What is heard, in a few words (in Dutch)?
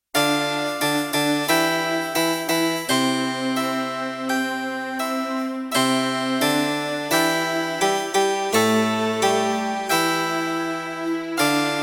Bas en Viola; Bas en Viola in China